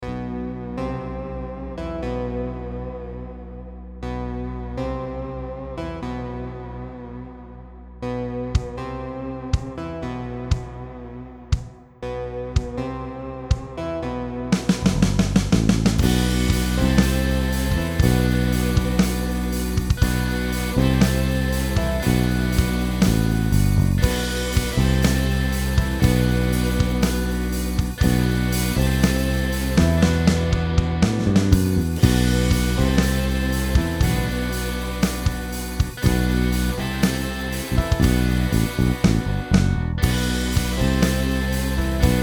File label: metal type beat